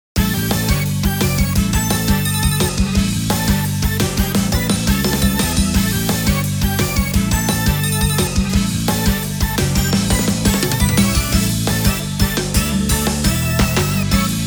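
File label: Result loop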